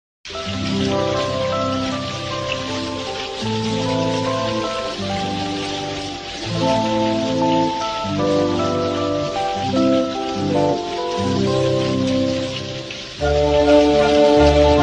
instrumental; doraemon bgm

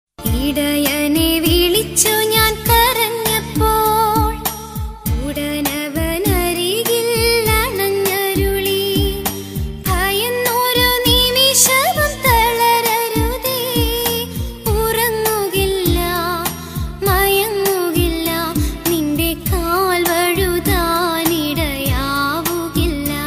malayalam christian ringtone

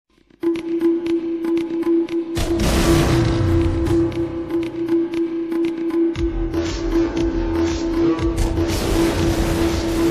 remix ringtone, attitude ringtone

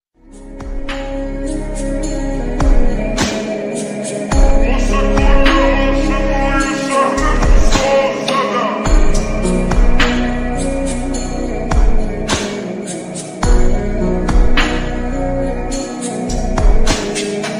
slowed reverb sad ringtone

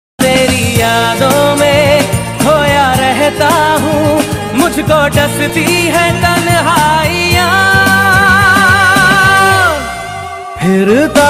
broken heart ringtone

